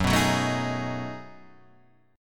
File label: B 13th Gb, chord